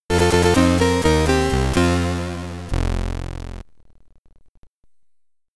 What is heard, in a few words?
Hall of Fame (SID Stereo); Mindbender; c64